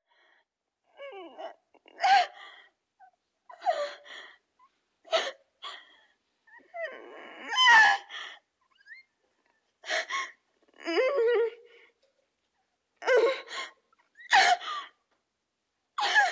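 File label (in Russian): zvuk boli jenskii, female pain sound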